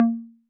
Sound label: Conga Low 808 Sat